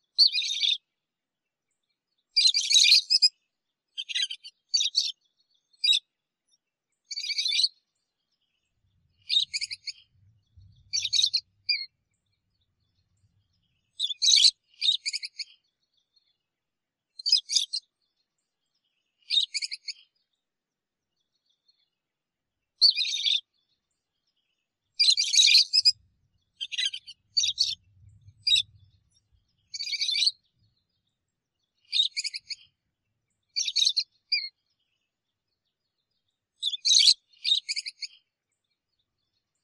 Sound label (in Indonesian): suara panggilan lovebird